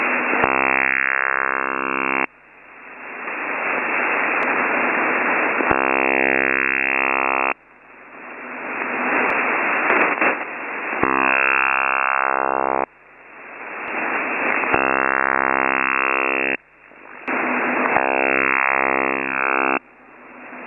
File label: Chinese OTH Radar 66.66 sweeps-sec bursts